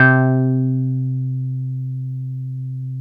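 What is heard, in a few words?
OB8 piano C2, OBERHEIM OB8; Big Fish Audio - First Call Vintage Keyboards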